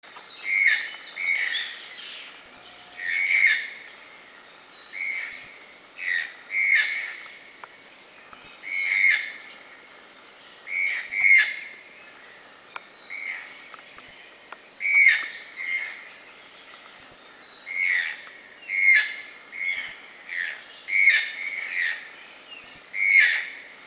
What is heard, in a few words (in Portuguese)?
canto do tucano de bico preto